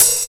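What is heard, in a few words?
OPEN HAT; East West; Phat & Phunky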